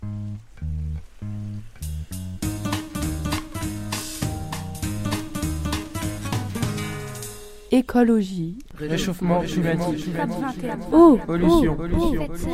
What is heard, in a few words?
jingle ecologie